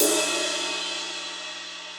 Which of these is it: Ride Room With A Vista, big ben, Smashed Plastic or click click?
Ride Room With A Vista